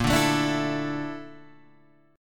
Bb Major Sharp 11th Bb down Guitar Half Step Down, chord